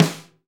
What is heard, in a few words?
SD, Real Kit; 90 s Sample CDs; Sound & Vision - Gigapack I CD 1 (Roland); Real-Kit m16